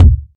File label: Solid Kick Single Shot D Key, Royality free bass drum tuned to the D note